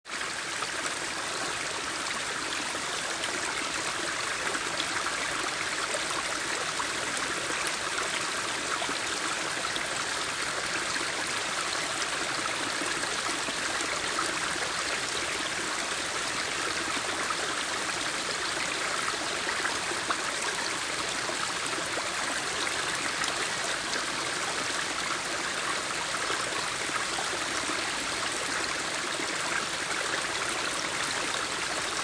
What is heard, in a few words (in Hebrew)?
נחל